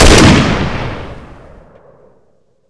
garand shoot, weapons, ww2; sven; ww2projekt

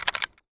c96 rattle, weapons; sven; ww2projekt

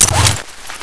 maxim reload chain pull, weapons, ww2; sven; ww2projekt

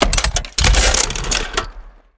maxim reload bullet chain, weapons; sven; ww2projekt

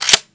tokarev reload unlock, weapons, ww2; sven; ww2projekt

